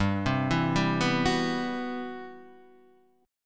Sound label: C Major Sharp 11th G